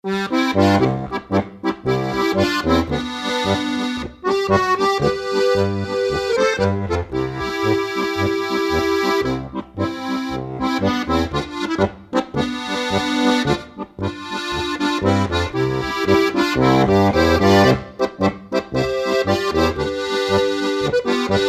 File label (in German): GCFB Lied, harmonika